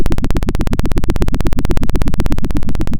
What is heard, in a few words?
Sound of a helicopter